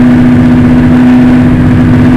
shelby off very low

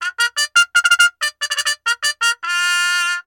SPEEDER FANFARE; lego star wars the force awakens 2016 ps4

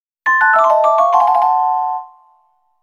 Fanfare; Got a Rupoor

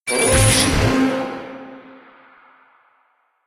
bossfight engage

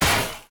grate break; Hollow Knight

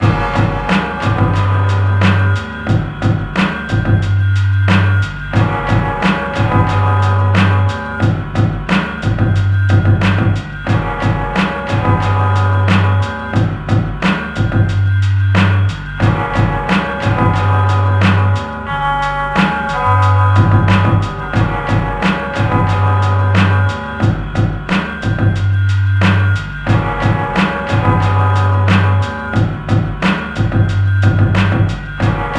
Radio Inside; Latino Ghetto Radio 2

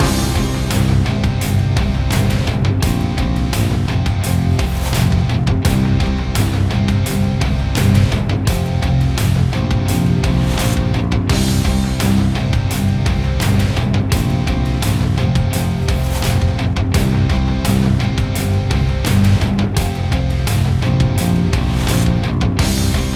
BGM Kowloon Street

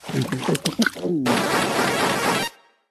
Bossfight Paragon grow; Level16

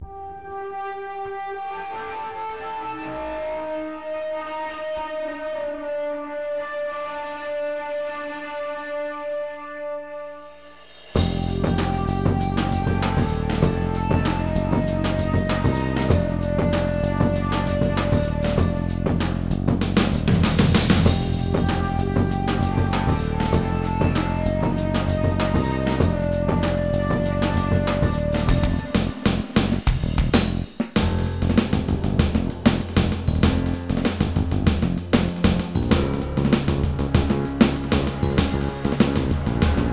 Heartbreak SFX; command and conquer gamerip complete 1995

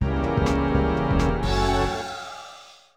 Fortress of Fear Realm Fanfare; Glover; Nintendo 64

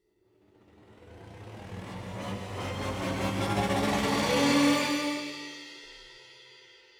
Mountain Boss Swell, soundtrack; trifox

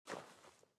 step sand

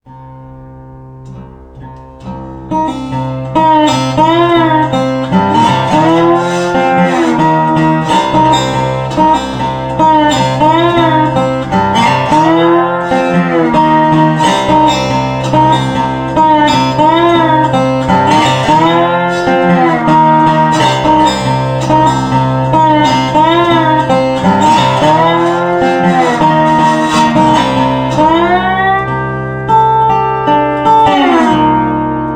Solo Guitar; Rage; Westar

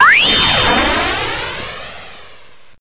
Meteor Mash; pokemon reborn e19 2022

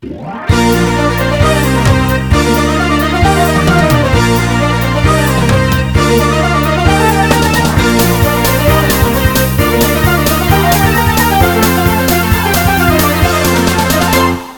bgm slot; Slot Girl Moe Story 1 2; android game music

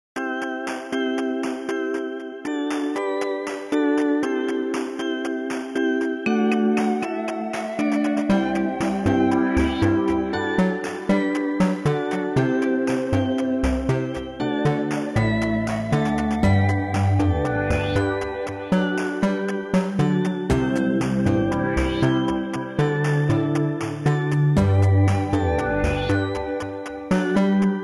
Lounge; Operation Crustacean - Alien; club penguin operation event trilogy

